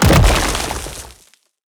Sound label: rock